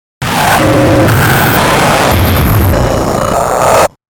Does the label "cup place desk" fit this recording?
No